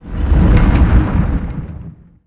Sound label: Sfx Colossus Movement